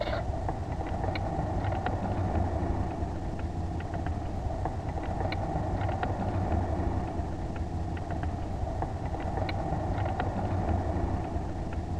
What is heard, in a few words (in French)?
Grammo Tourne